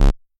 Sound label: bass